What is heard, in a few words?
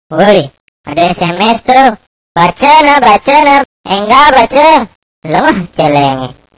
SMS Bali